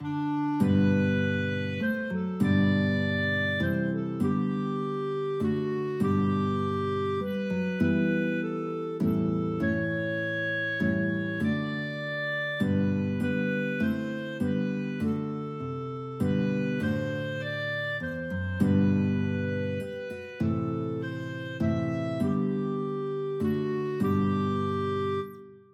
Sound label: crimond guitar clarinet, guitar clarinet duet